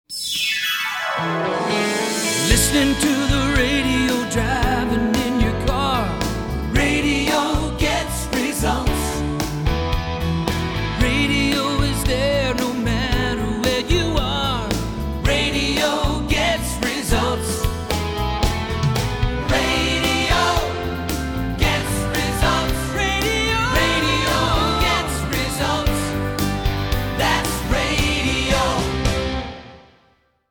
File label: radio gets results full vocal